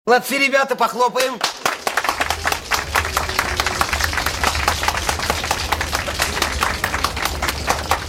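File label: let's clap sms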